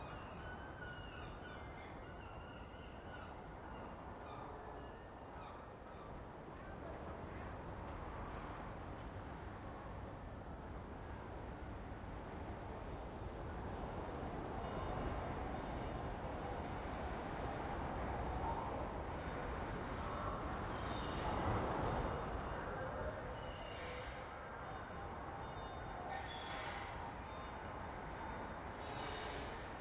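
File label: ambience piltover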